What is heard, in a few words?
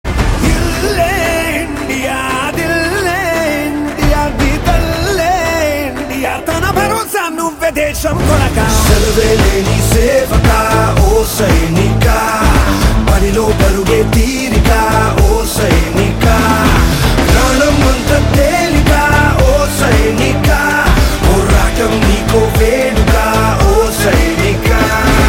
Ringtone